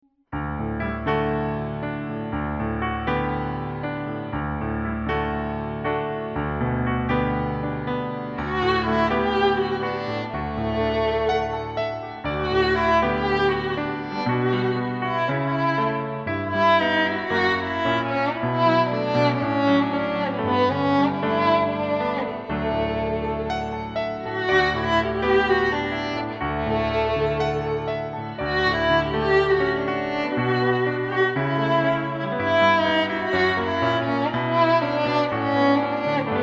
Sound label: violin, Demo; disney